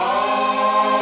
auuuuuugh

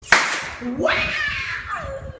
uahhh

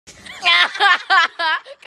yahahaha

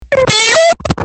biiiiiiiiim

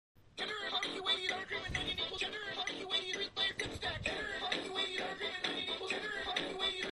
layer dip stack phonk

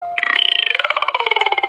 deep meow

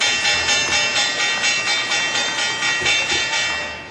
opening bell

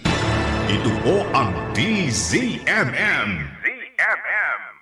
ident

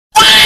cat laugh single

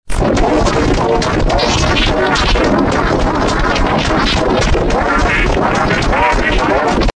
cafe sound

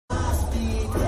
moshpit

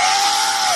raaaahhh

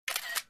iphone camera flash

